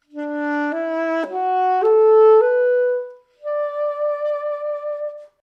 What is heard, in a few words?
D Pentatonic